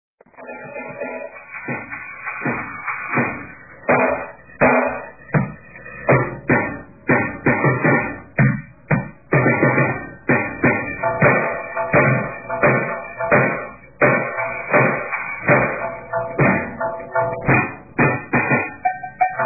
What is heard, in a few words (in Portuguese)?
bateria